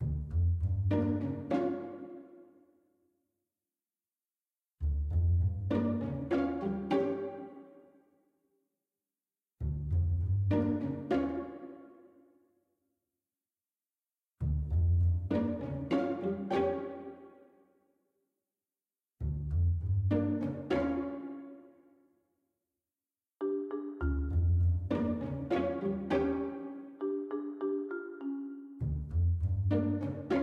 music gameplay loop; Ben 10 - Omnicode

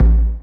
bump; Nickelodeon Basketball Stars 3; ThrillGames

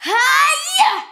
idle, Truck; loudhouse normal

